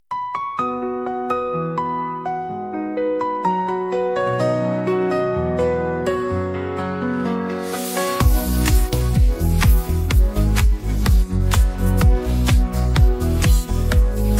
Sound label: Accompaniment